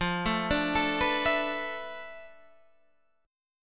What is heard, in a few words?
D Minor 6th Add 9th F, guitar chord